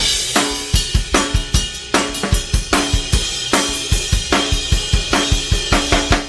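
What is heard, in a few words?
metal band drum solo, funky drum loops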